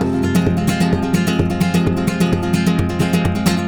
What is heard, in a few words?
130 F Rumba; Spanish And Gypsy Traditions; Soundscan vol.59; AKAI